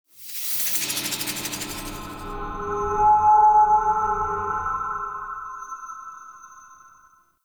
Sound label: Root Cave Psychedelic Moan Texture A; Ambience; One Offs; Neathuns Root Cave